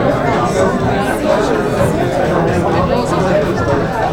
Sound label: bar crowd